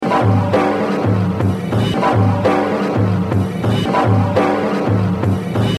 Exercise, jazz drumming; Kenny Clarke; The Evolution of Jazz Drumming (Gottlieb)

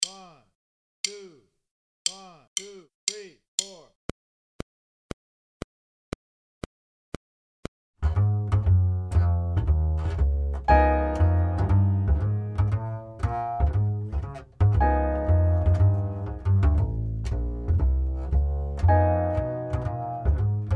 Swing, Drum Fills; Essential Drum Fills; Erskine

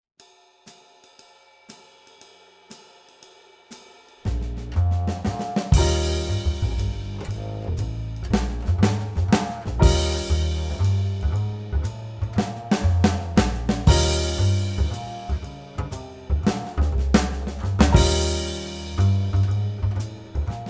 Swing, Drum Fills; Essential Drum Fills; Erskine

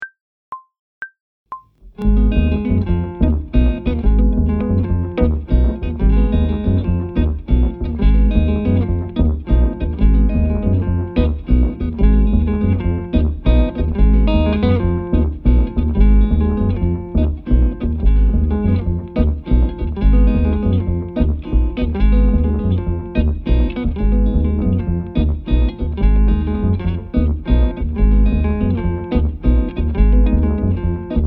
Afro-Cuban 6-8 120bpm, Brushes; Phrasing and Freedom with Brushes

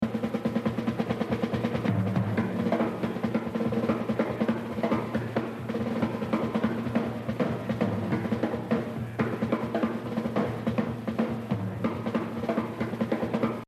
Exercise, Jazz Drumming; The Evolution of Jazz Drumming (Gottlieb)

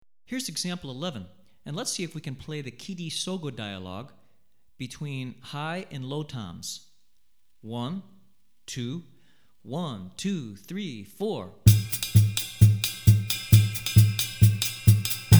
Drumset, West African Rhythms For Drumset; Gahu; Hartigan

